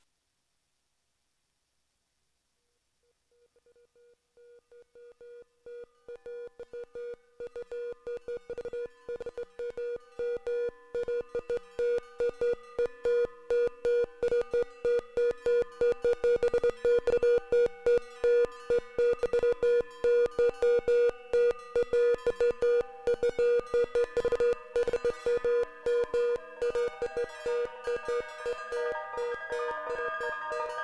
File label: ms20 sync; Scott Stites